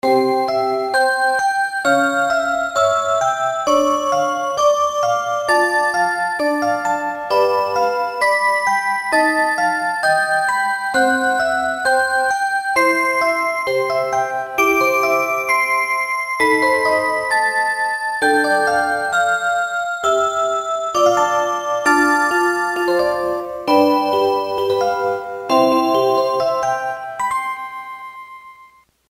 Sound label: rhythm song, clocks